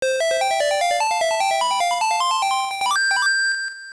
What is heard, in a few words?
Harp; Play the Tune of Echoes; Oracle